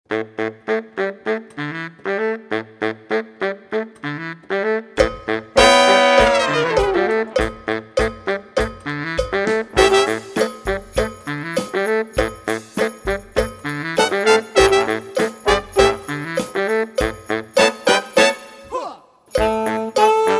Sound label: Funky Christmas